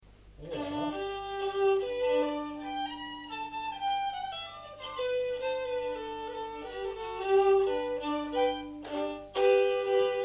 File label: polka